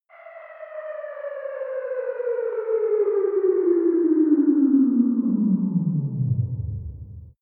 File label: fighting racer dock arrival